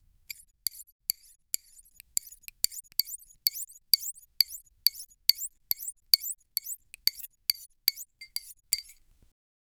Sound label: continuous soft asmr soun, knife